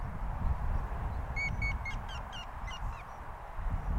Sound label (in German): grauspecht